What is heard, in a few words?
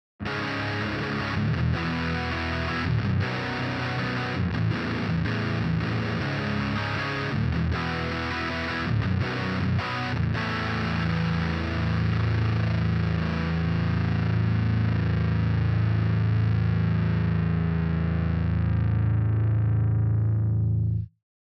filters, extended drive